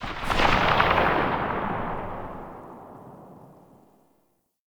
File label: Incarnon Wrist Gun Tail, Weapons; Warframe; Tenno